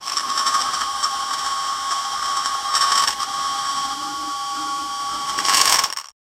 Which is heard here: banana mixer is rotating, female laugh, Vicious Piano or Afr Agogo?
banana mixer is rotating